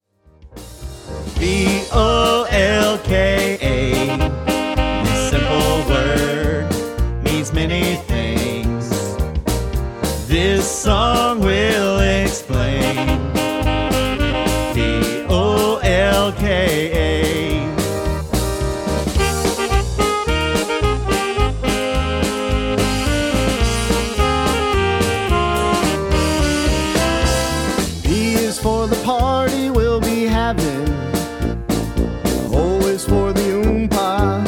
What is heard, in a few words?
POLKA